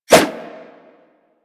Nunchaku Whoosh, Weapons; Warframe; Tenno

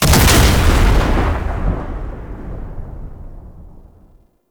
Vent Explosion; Warframe; Entrati; Alchemy

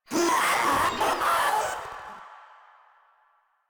Vocal Attack; Warframe; Proto Infested Runner

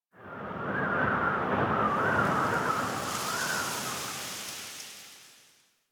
Wind Gust Small, Ambience Plains; Warframe; Eidolon Plains; One Offs

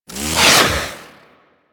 Kusarigama Plasma Whoosh; Grn Kusarigama

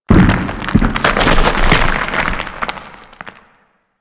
Cave Sounds Random B; Warframe; Ambience; Grineer; Gnr Cavern Corridor 1

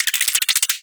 Tiny Creatures; Ambience; Warframe; Corpus Railjack

